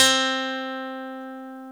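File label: guitar C3; AKAI S-Series CD-ROM Sound Library VOL-8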